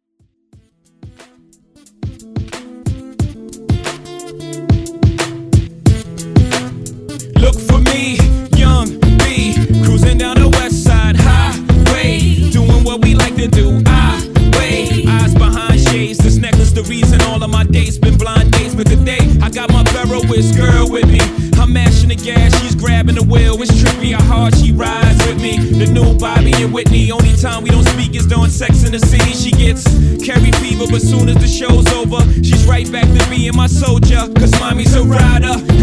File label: Throwback Redrum